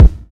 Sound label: 2000s warm sounding kick drum d sharp, Royality free steel kick drum